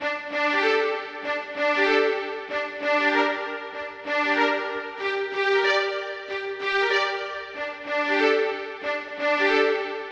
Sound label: strings, Sad Loops